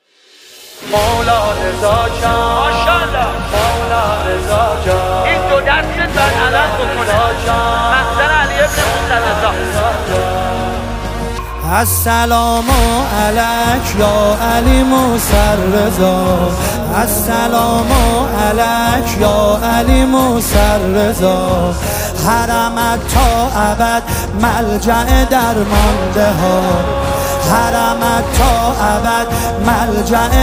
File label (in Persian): نماهنگ